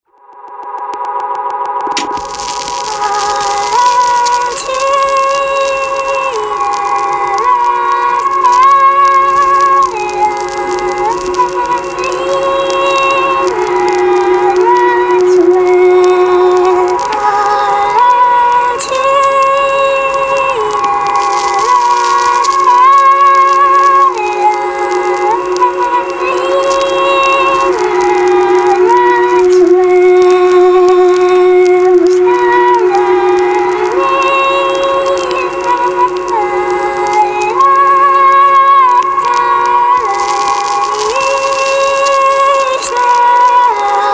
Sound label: Murmur; Sever